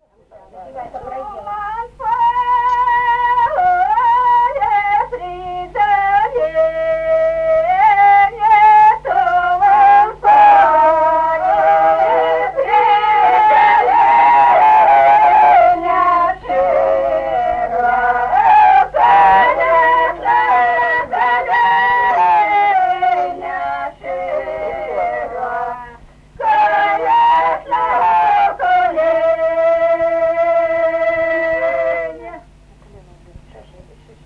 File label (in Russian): Туман в поле при долине (карагодная)